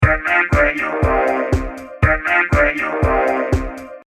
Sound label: vocoder